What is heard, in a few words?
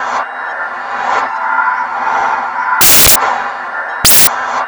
UNDERWATER; COUSTEAU; Spectrasonic Distorted Reality 1